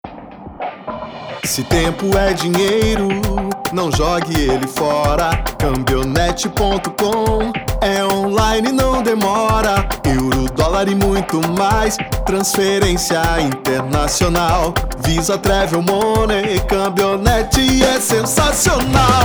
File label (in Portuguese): Jingle; Cambionet